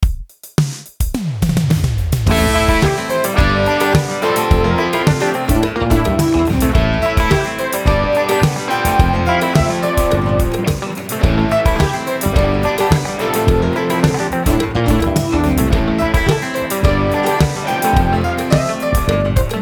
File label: quick phrygian; weeklybeats 2026